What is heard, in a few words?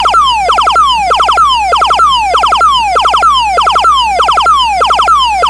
new thunder; galls